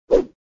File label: boar head swing; moonstone madness